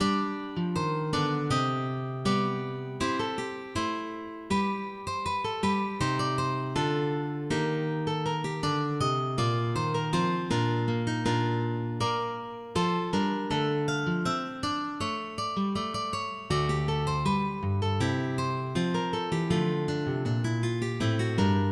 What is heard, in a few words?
Polish dance